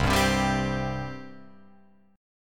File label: C 5th C down Guitar Open F; C5 strummed